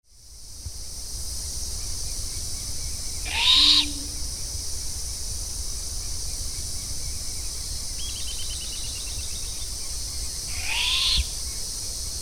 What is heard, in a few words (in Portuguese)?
Filhote, coruja; a maior coruja do continente em macaé